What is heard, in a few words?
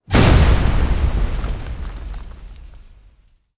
Blow; blue archive